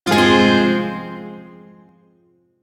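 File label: Shawzin Minor Chord; G; Warframe; Duviri Shawzin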